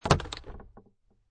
Transportation Automobiles, Car Interior, 1996 Acura Integra, Glove Box Open, No Engine